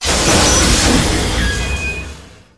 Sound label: ship landing gear up